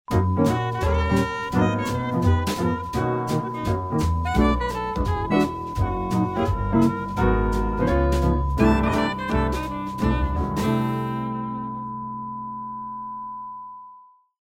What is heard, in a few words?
groovy jingles